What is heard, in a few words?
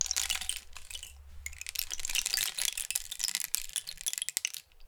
Rain Stick, Percussion Samples